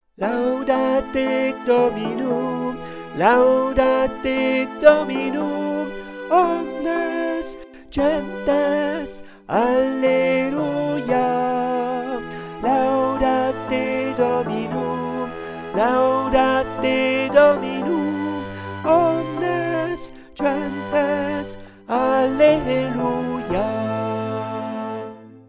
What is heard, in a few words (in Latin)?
Laudate Dominum TENOR